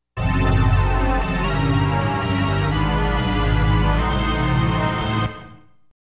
CPU Victory; Konami Music Masterpiece Collection

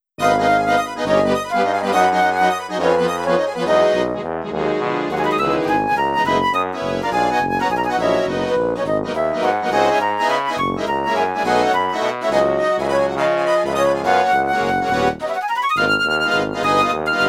Languorous Waltz-ish

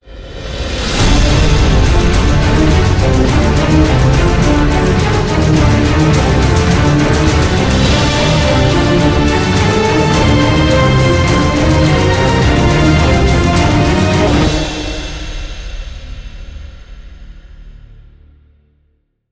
victory

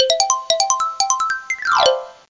Xylophone; kentei kyoukai imasara hito ni wa kikenai otona no joushiki ryoku training ds

